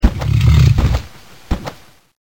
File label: dragon